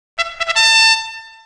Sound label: task complete; cstrike; Endoplazmik v1